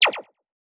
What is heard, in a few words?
scifi weapon synth blaster